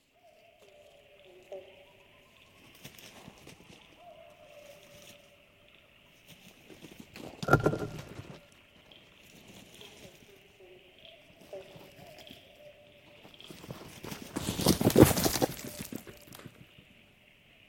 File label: brush-tailed possums grunting in the woods